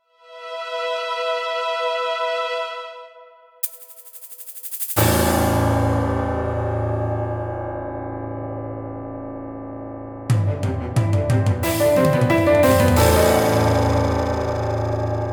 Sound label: RPG ghost town; City